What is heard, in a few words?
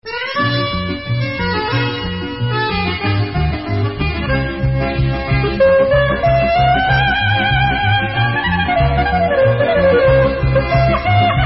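Instrumental, Israeli Dances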